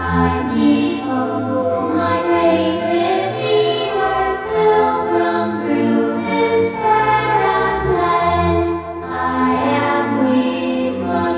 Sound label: choir